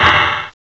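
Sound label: HI-HAT CLOSED; CYMBALS; CMI Sound Library Rev 1.3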